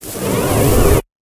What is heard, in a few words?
Inflate; smite; god cupid skin13